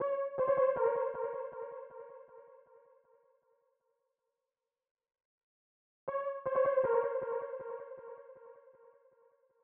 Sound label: 158 BPM A# Min Synth; Cymatics; Waveracer